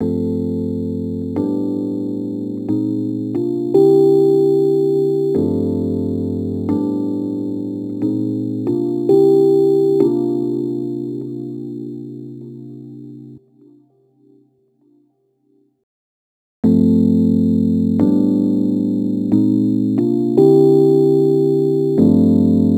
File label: Rhodes Attacks; Ueberschall